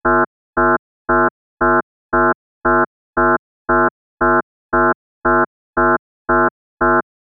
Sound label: Alert